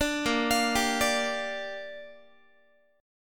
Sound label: A sharp 6th, Guitar; A#6; arpeggiated